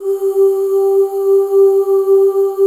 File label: G3 FEM OOS